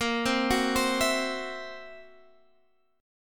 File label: Fsharp 7th Flat 5th, guitar chord